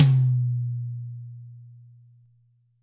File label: Birch Tom Hard; apulSoft apTrigga2; Steven Slate Drums